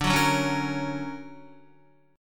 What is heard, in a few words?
D Augmented Major 7th down Guitar; D+M7 strummed; JGuitar